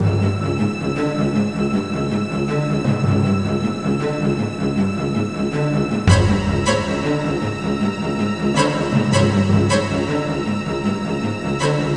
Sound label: CHASE; Goosebmp